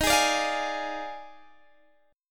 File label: Eb Major 7th Suspended 2nd Suspended 4th, Guitar DADGAD; down